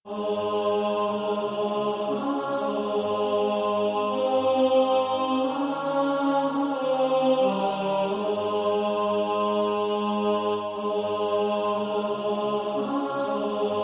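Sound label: tenor; Pass Me Not O Gentle Savior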